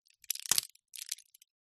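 household medicine nicotine gum remove pop out of foil pack; zapsplat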